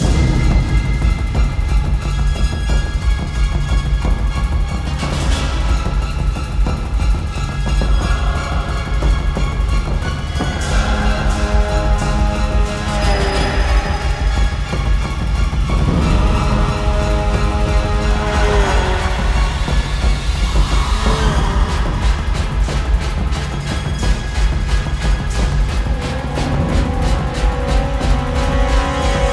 Action FULL Loop 180BPM, Classic Horror Sci-Fi Music; Dead Ark; Action 3